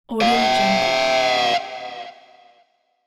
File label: comical guitar